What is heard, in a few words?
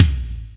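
bass drum, right; Zero-G - Chemical Beats; BASSDRUM ZOO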